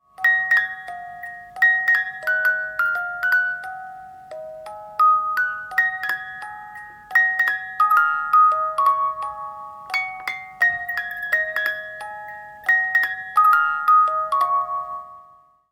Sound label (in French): boites musique